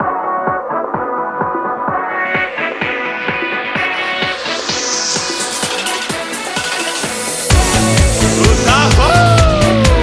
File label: Male Vocalists; Z-I-v Summer Contest 2013